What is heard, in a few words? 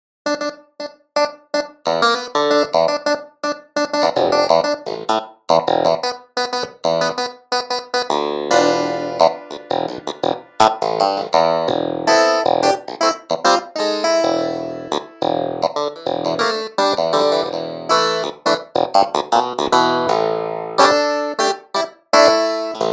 clav; Kurz